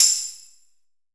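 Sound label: FX sleigh bell; AKAI S-Series CD-ROM Sound Library VOL-5; FX PERC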